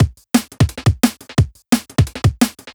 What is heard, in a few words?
DNB BREAK